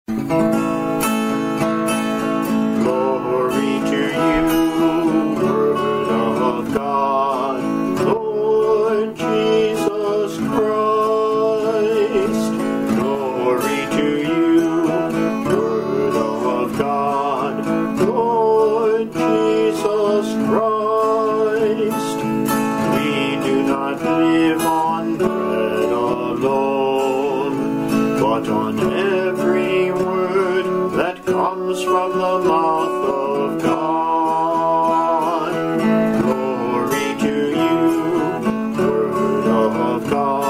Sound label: Gospel Acclamation Lent; SMMC Service Music; Lent - Easter